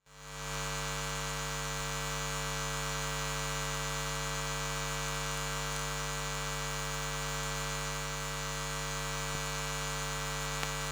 NOISE EMF Radiation, Constant Hum, LCD TV Screen Off; Articulated Sounds; Electromagnetic Household Fields